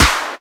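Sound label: CLAP snare drum; Best Service Hyper Dance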